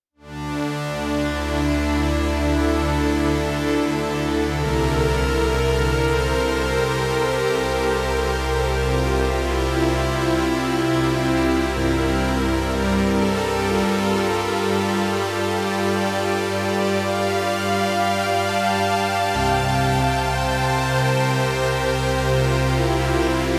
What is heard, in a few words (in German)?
String Pad mit Delay und Hall